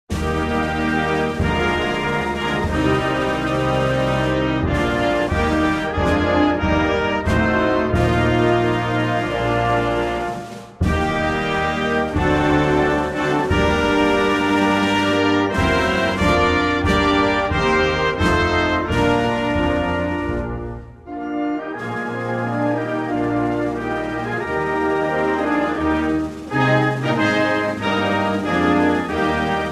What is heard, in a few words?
Canada national anthem instrumental